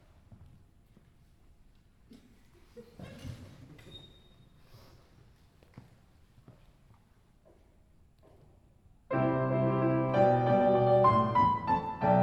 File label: liberty hill mixed